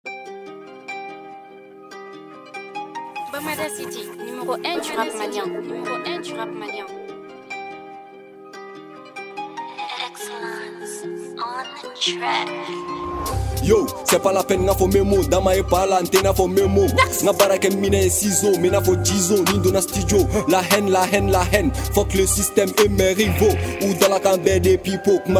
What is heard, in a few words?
DRILL